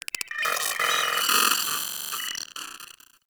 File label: Arachnoid Micro Vocal Idle; Warframe